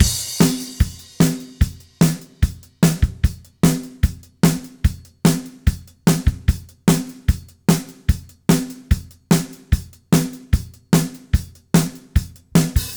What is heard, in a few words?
Beat Loops, 148; Zero-G; Monster Beats